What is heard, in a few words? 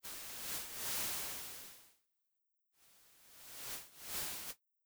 NOISE GENERATOR